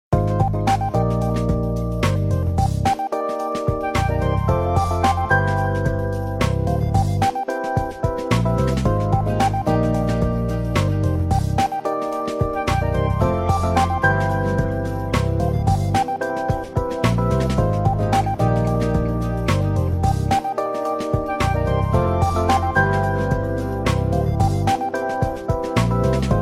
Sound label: Nokia 6120